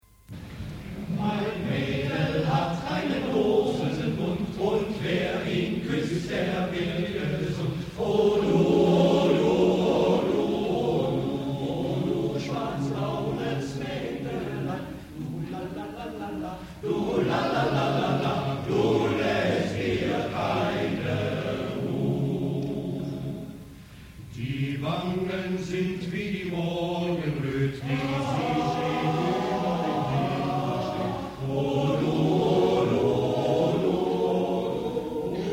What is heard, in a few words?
male choir, mein madel hat einen rosenmund, live; male choir of mephi